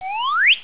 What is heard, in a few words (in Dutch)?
fluiten op